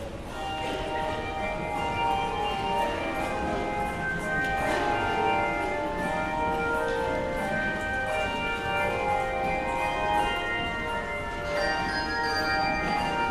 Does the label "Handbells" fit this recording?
Yes